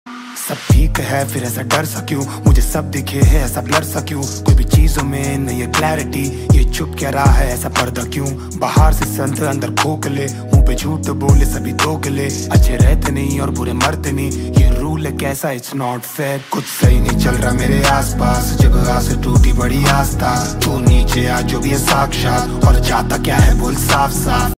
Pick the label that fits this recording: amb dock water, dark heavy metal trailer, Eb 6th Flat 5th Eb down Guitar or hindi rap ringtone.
hindi rap ringtone